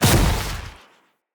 Shrimp Lantern Weap Cluster Proj Split A; Creatures; Baliq

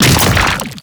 Horrors Arm Spawn; Warframe; ManInTheWall; Octopede

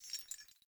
Grunt Foley Movement Chains; Enemy; Sinecure; Melee Grunt

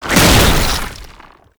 Underground Attack Stop; Warframe; Abilities

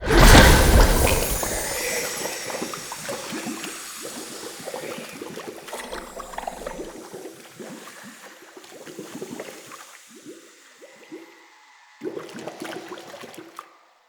Spell SFX; Cinematics; Bromius; Bromius Quest Verminia Spell

